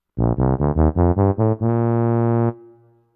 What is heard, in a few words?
tuba short